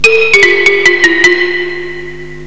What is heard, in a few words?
XYLOPONE